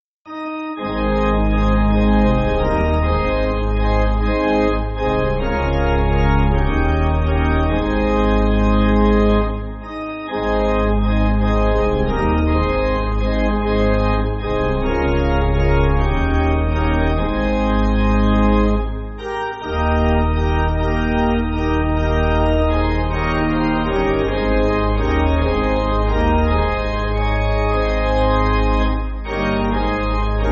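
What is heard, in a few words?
Pipe Organ, Free Music Backing Files for Churches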